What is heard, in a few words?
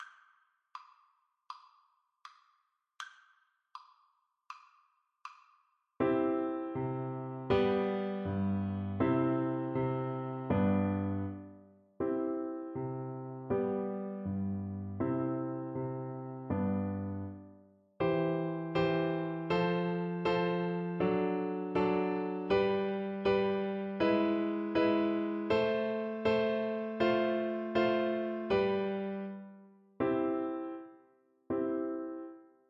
Concerto for Mandolin in C FL kar, Flute